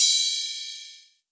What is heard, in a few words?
Ride, Cymbals; Vengeance Samples